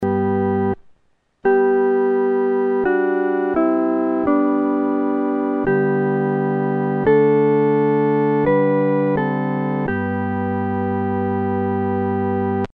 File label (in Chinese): 合奏 四声部; 阿门颂（一）